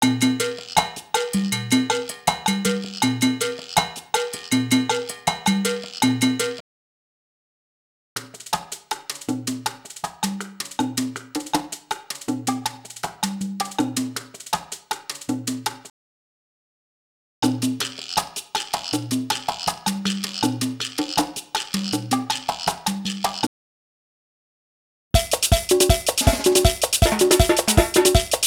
Salsa Picante; Masterbits; World Zone VOL-3

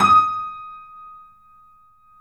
D#, 3D Pianos, Boesendorfer; E-MU Producer Series Vol. 5; 3-D Audio Collection

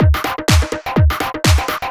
125 BPM, Drum Loops; Zero-G; Club Techno; Drum Set

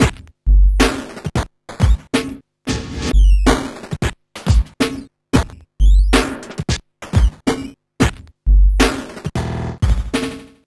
rainfall 090bpm; Zero-G; Downbeat Dance Grooves

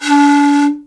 PAN D 3, REED PIPE, Africa; Spectrasonics; Heart of Africa 1

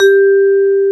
C PERC; AKAI S-Series CD-ROM Sound Library VOL-8; SET#1 C PERC

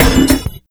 Hit, Fx

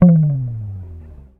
HOFNER, Bass; Sampleheads; Will Lee Bass Library; HOFNER SUS

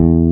BASS E2, e-bass

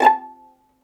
VIOLIN, Synclavier Strings; ILIO

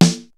KICK SNARE; Kid Nepro; Elite; KICKS SNARES

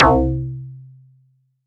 Hit, Stab & Hit; Bitkits

